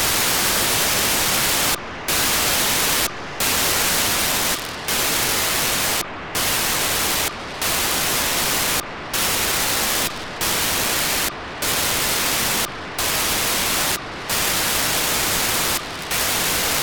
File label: VDNH-80 436MHz500 4k8 Mobitex 9x beacon